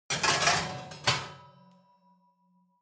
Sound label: oven baking sheet draw in